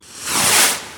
Sweeps Short

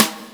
snare, DnB Breakz Kit; Breaks N Beats Sound Kit

